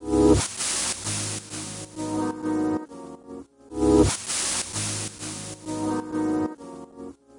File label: Chd Fx Loop 130; Equipped Music; Loungin House